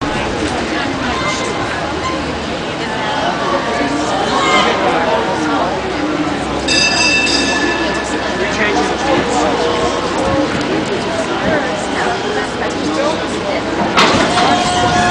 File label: Cablecar turntable